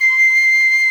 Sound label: C5 PICCOLO; Northstar; New Gold VOL-2